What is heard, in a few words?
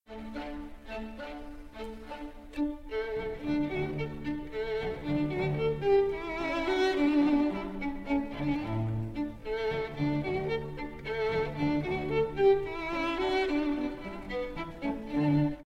Beeth Vln Slow